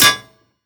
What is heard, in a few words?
bullet metal solid; physics